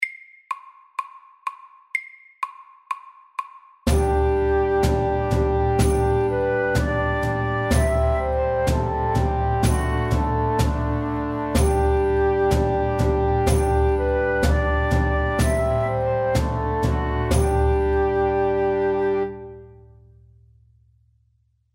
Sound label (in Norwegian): Engelsk melodi FORT